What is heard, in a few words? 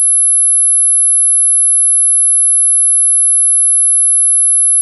sin 11000